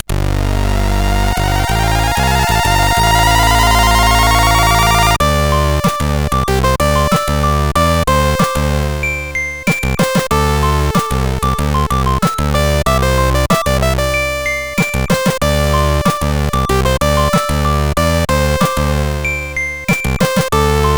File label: sid CSG8580R5